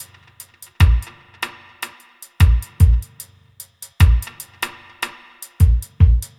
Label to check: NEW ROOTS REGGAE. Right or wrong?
Right